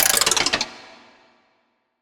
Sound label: Break Robot